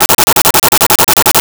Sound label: Arcade Movement; 1001 Sound Effects